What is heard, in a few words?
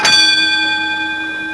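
school bell, sirens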